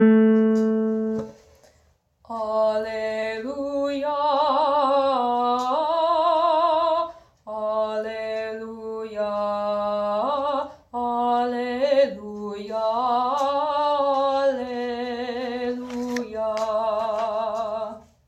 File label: alleluia alto